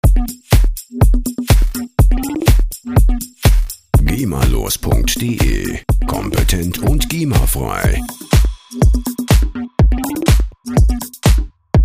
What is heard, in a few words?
rhythm